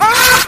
scout pain severe

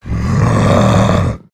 alert, male zombie; nmrih; zerosiki; slender

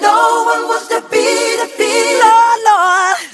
spy positive vocalization, voice packs; spy jackson

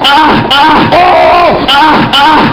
ahah

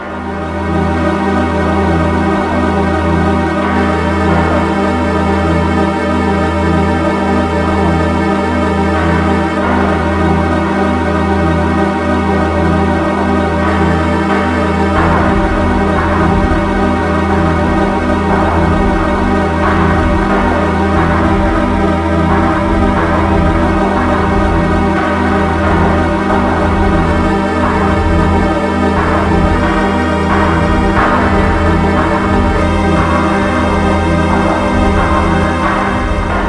alert bgm; nutcracker; lethalcompany; zerosiki; slender

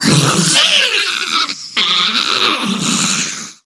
low health; scarlet; slender

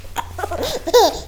baby talk; tm baby; slender

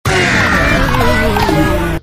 failure all; tf2ware ultimate; music game

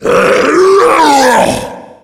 tank throw, yell

